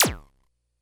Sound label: Bleep; Korg DS-10